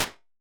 Little Room, impulse responses, small spaces, rooms; Apple